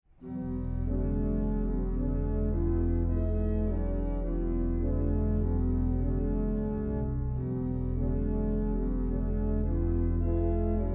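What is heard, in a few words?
antiphon, organist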